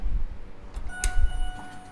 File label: elevator